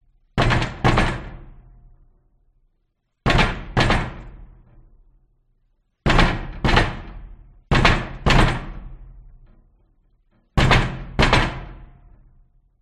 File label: Door Abandoned Warehouse Hallway Perspective Reverberating Old Wooden Door; Kicks Double; skyes audio